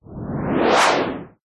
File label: whoosh white noise harsh; sound design